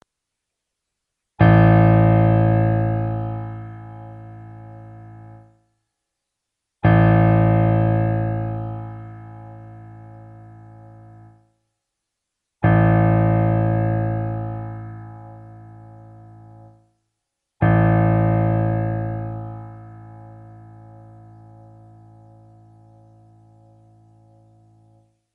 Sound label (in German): kontrabass a; wie kann ich meinen bass stimmen